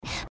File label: breath; pony; Twilight Sparkle